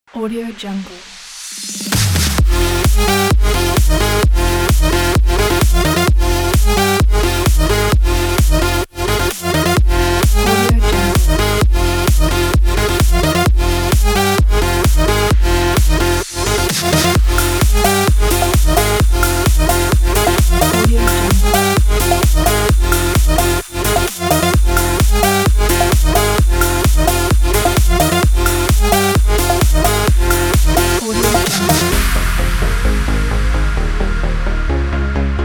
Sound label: edm sport